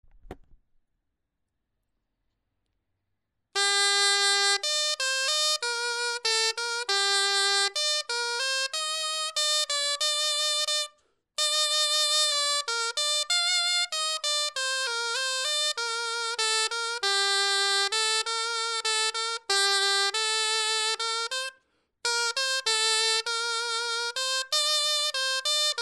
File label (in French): clarin etude